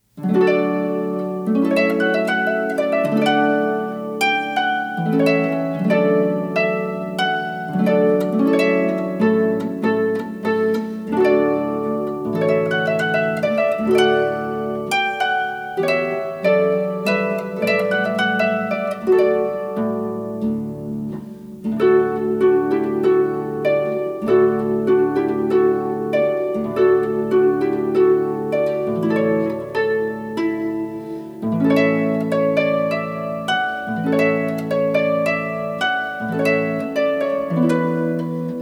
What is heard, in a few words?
TRUMPET VOLUNTARY